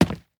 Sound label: hardwood footstep; metrocraft